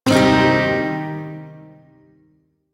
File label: Blues Chord A; Shawzin; Warframe; Duviri Shawzin